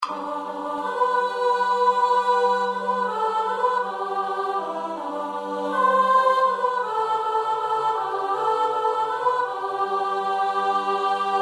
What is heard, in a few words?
Siyahamba SOP